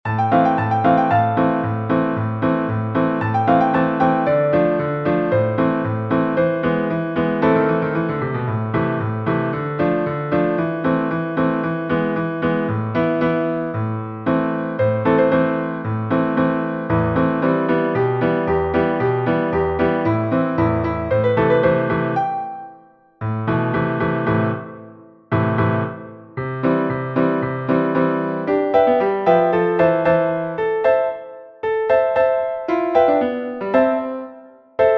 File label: Piano